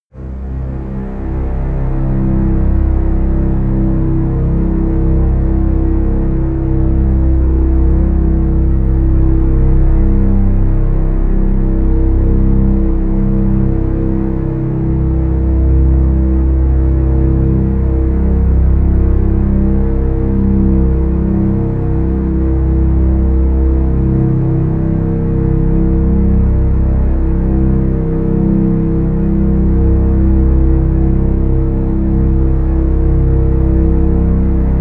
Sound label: drone simple double bass